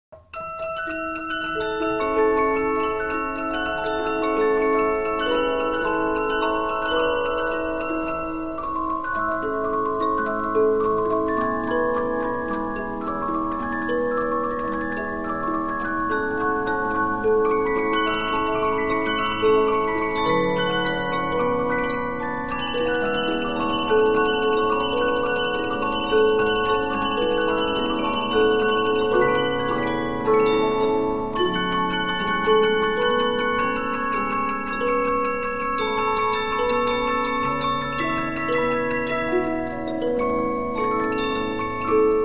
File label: Polyphon, 15inch disc musicbox